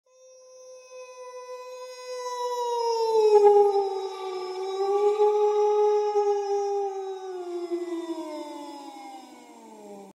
horror movie sound

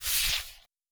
Paper Slide

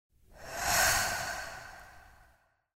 Breath Whoosh

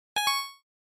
Game Menu Select

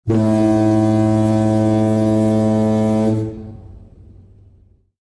fog horn; fog horn sound effect

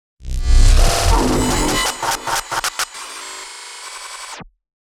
Access Denied, glitch error sound effect

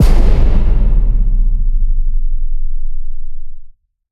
Impact

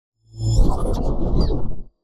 Network Connect

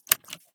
click; menu click sfx

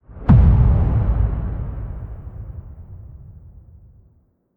Boom Hint of Metal; metal boom hit; Evolve

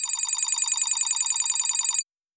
Display Digits, alarm

